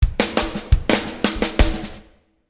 Drum Fill; Drum Fill 1

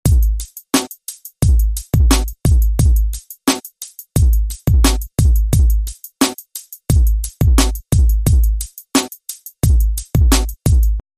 Drum Loop

